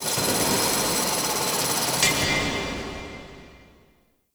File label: Mining Machines, Asteroid; Warframe; Grineer